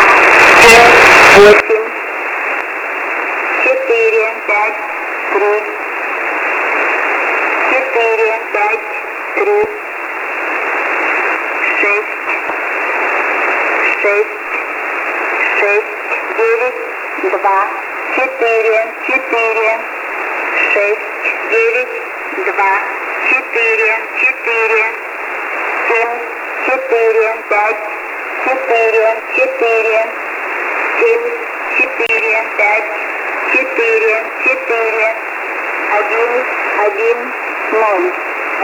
usb msg; s06s 5810; avare

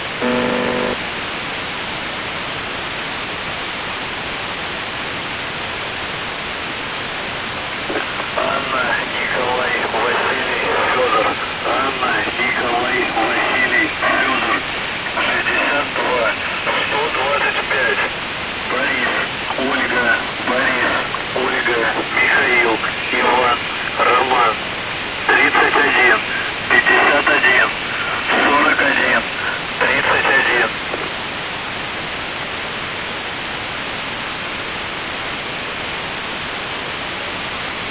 buzzer usb msg

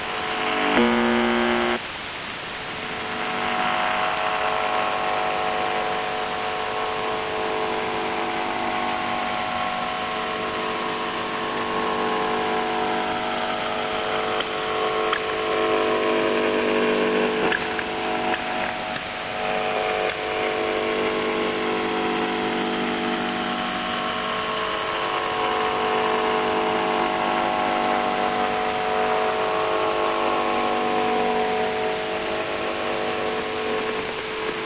buzzer usb msg

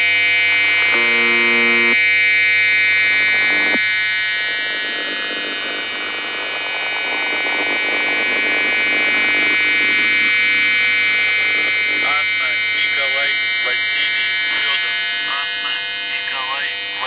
buzzer usb msg

